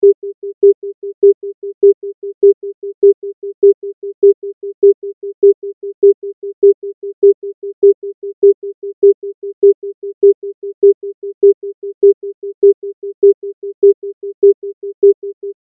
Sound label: 400Hz 3 beat; music; rhythm